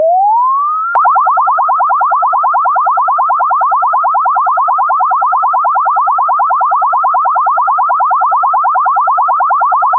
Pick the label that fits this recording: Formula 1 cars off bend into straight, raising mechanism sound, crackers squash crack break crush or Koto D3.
raising mechanism sound